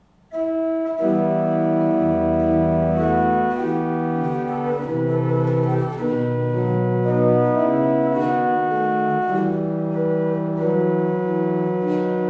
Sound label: Buxtehude Allemande, Orgel; Schoenermark; joachim wagner orgel